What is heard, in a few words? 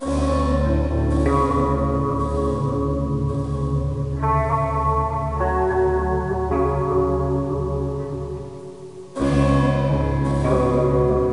seagull slow